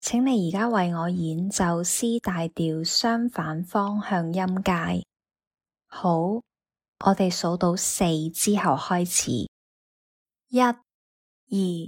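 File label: C major contrary motion scale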